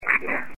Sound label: EVP; Country Tavern